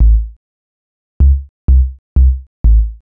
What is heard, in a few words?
HOUSE BASS 125 A